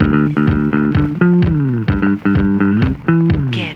bass, Loops Phonky

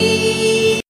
eee SUNG, Voice; ooh ahh

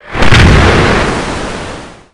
spatch; Crash Bang Boom

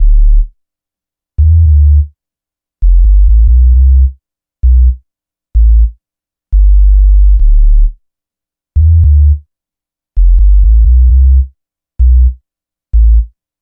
bass filthy, Loops, Jazzmatazz

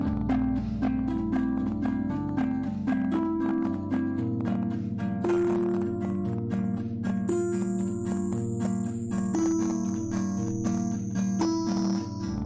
guitar, Sad

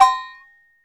bwang, Bells

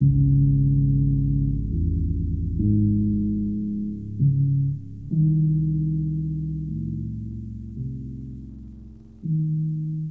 bass, Loops, Sad

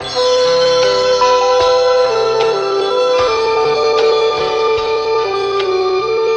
flute, Loops

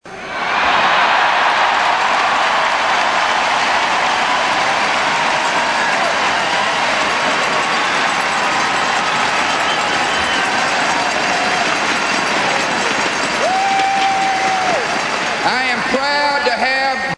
applause

